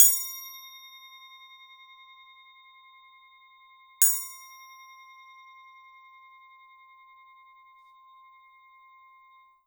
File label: crotales naked